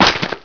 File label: player sand